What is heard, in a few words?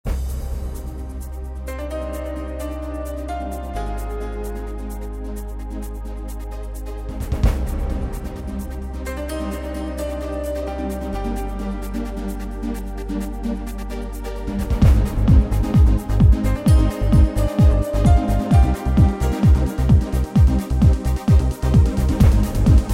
ibiza trance